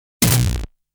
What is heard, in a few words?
explosion sound; genshitou prehistoric isle